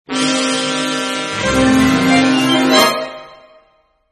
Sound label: Grandmothers House INST